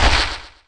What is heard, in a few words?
bash